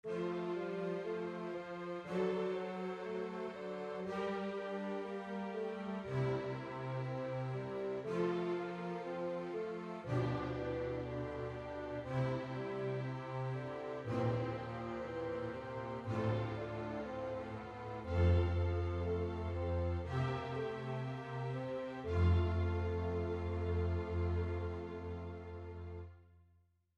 Vierstimmig Dritte Gattung

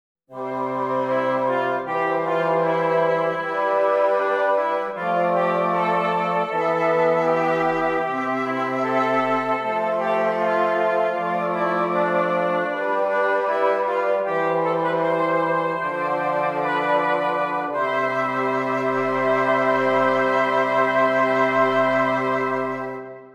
Stimmiger Contrapunkt; 5 Gattung; Ronge

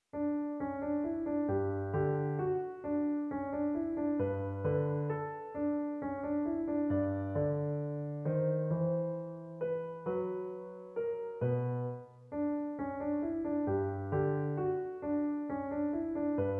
Minuett

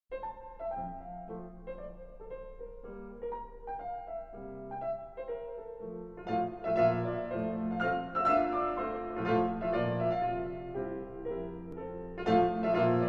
Ballett Klavierauszug Allegr; Hess 090 Die Geschopfe des Prometheus; von Salvatore Vigano; 05 Allegro vivace

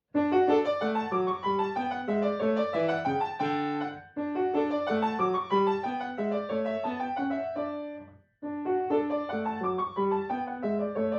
Deutsche Tanze fur orchester Klavierubertragung

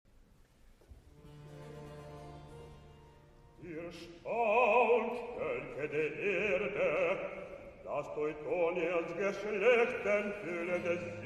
Rezitativ, Solostimmen Chor und Orchester; Kantate auf die Erhebung Leopolds II; Bass

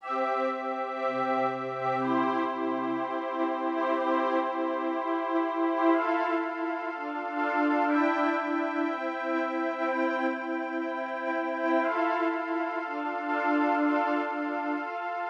Stimmiger Contrapunkt; Ronge

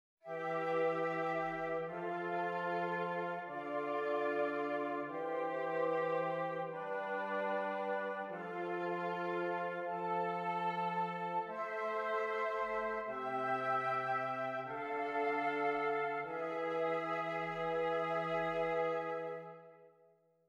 Zwei simmiger Contrapunkt; Ronge; Correzione di Albrechsberger

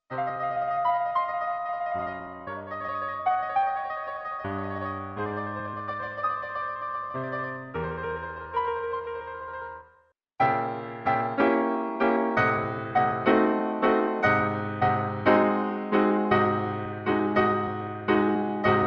Kadenz; Unbekannte Kadenz zum ersten Fassung des B Dur Konzertes

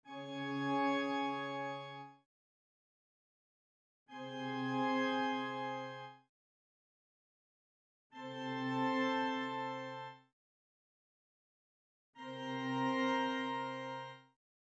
Sexten

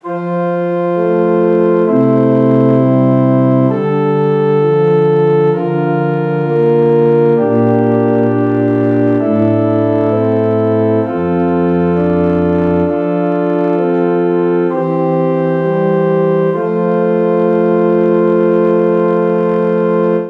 Stimmiger Contrapunkt; Ronge; corretto

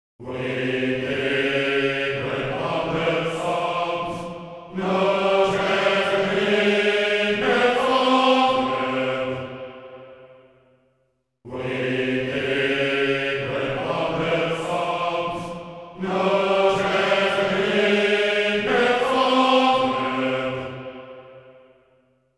zweistimmiger Kanon im Einklang; Ratselkanon Wir irren allesamt

